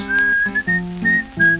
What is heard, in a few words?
whistle